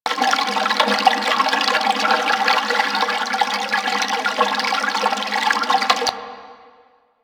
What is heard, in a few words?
Ocean Cloning Gurgle Loop; Ambience; Props; Warframe; Grineer Ocean